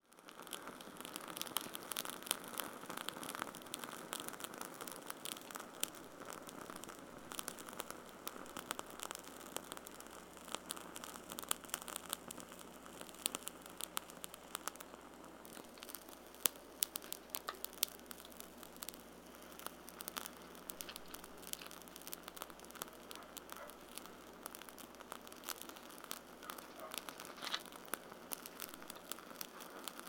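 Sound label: sound of burning beard hair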